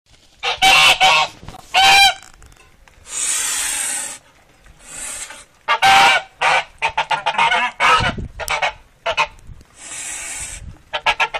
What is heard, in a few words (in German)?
gans; haus und nutztiere erkennen